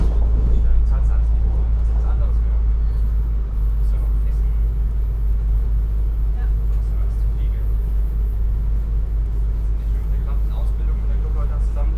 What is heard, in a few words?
Inside Train Noise binaural